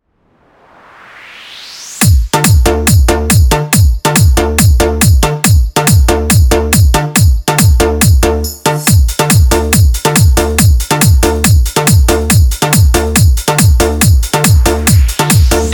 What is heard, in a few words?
Bhojpuri Remix Song